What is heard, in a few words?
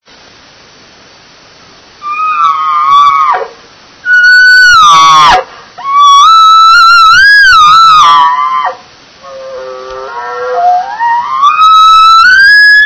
bull, elk; elk 2001